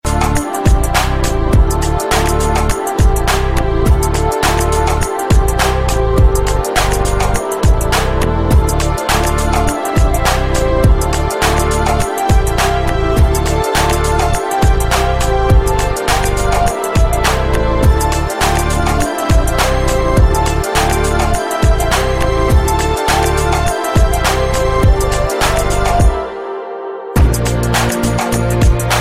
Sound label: instrumental